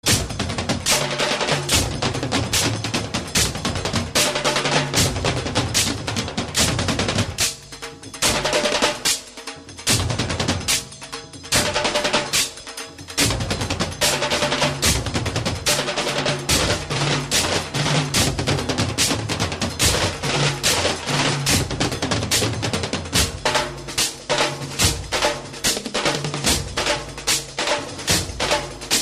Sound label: garba instrumental ringtone